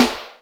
Electric Snare; Yamaha DTXpress

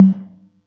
Yamaha EX-5

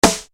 SD, Yamaha RY-30

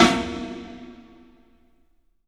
PA snare; E-MU Producer Series Vol. 5 – 3-D Audio Collection; 3D Percussives; PA Snare FX

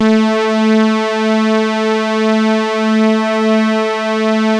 SAW, Synth lead; InVision Interactive; Keith Emerson; The Most Dangerous Synth and Organ

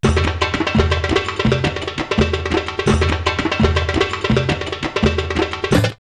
Sharia 84, drumloops World Ethnic; X-Static Goldmine

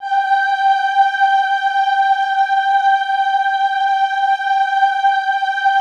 AHH G4 L, Classical Choir; Best Service; Extended Classical Choir; AHH LO+HI LL

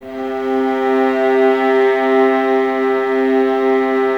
String Ensembles, Violas

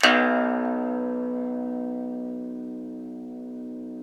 SU GZHENG, Earth Tones

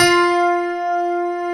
DPF F3; AKAI S-Series CD-ROM Sound Library VOL-2

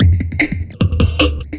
FILTER, Big Beat Drumloops

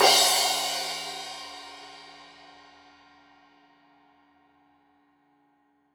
Crash 15in L edge, Cymbals Stereo

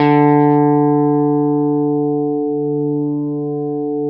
STRATOCASTR

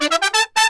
MERENGUE, World Grooves

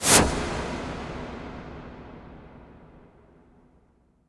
IMP WIPE, Cinematic Impact

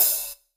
HH, Drum Mashines; EdgeSounds

